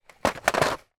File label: leisure board game dice shaker place into plastic housing in box